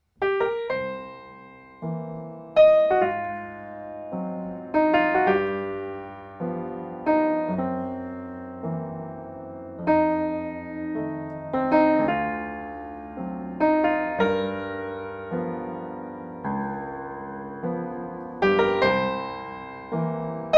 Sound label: bluesy ballad